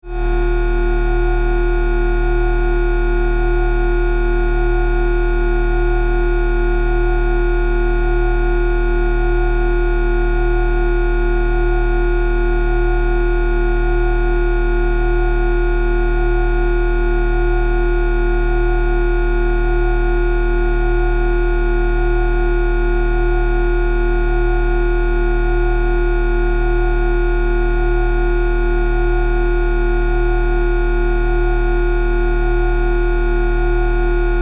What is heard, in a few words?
Force, Field, Energy, Source, Scifi; BlastWave